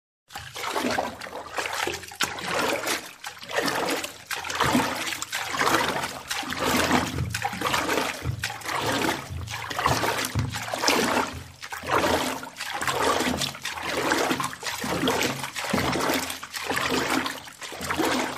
swimming sound, Jump water

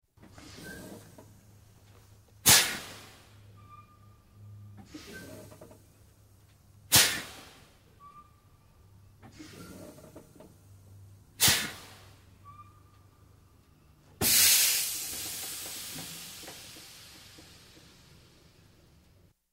multi truck air brake

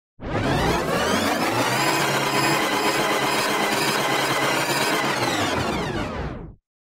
tape rewind long

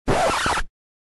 Record scratch; tape

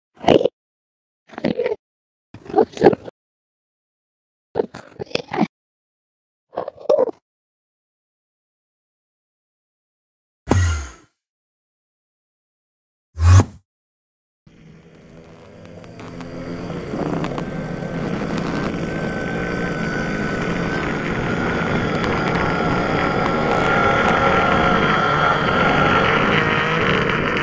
enderman zombie; mincraft; games

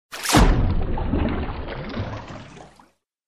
jump in water under water

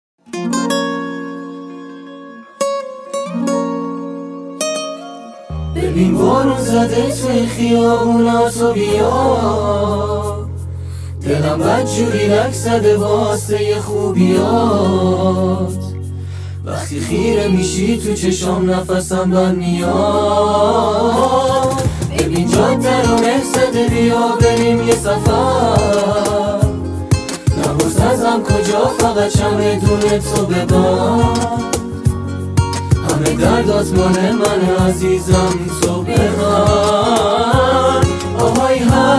Guitar

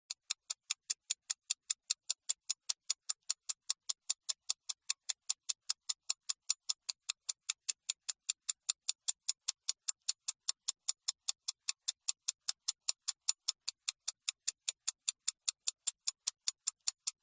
Watch Waiting Sound Effect

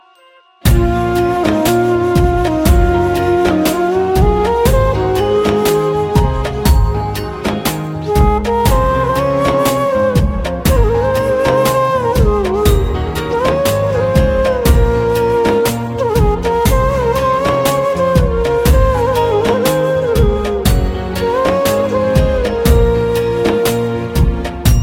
sad flute

